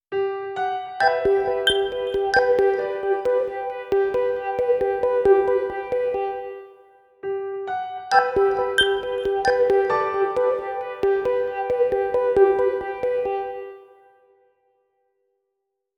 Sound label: ringtones; Tongass; Essential; PH-1